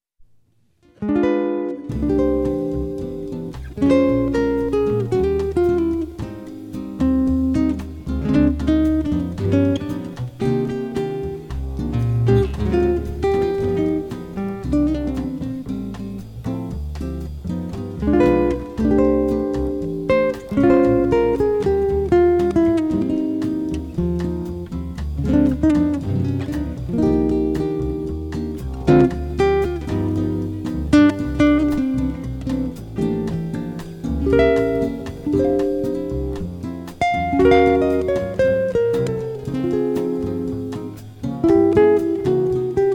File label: guitar